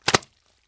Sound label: Zombie guts Wet Chamois; Doom; Zombies